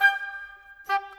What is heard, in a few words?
Flute Oboe, Orchestral; Sonic Foundry (Sony Creative Software); Orchestral VOL-2